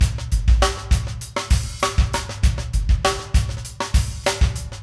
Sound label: funky drums